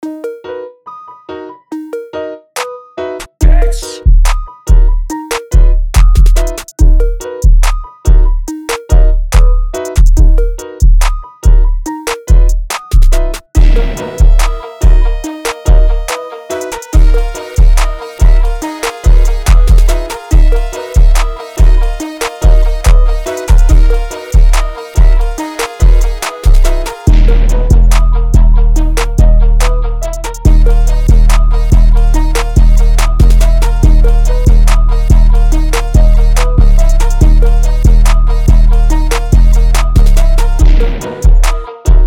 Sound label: beat